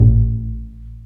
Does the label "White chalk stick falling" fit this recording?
No